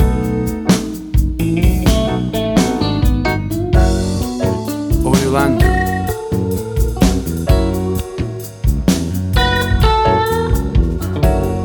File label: Bossa Blues